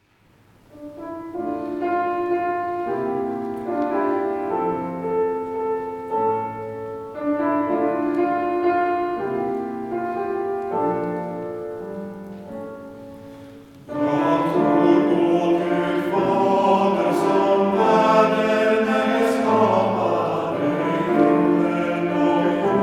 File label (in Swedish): Trosbekännelsen, vokalensemble